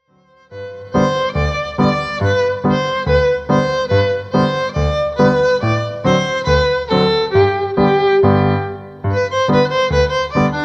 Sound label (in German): die turbo fiedel hoerbeispiel